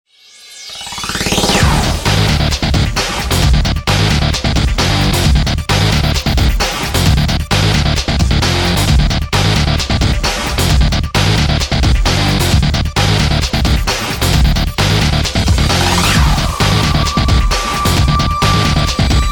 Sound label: Action Short; Emergency 4 Global Fighters for Life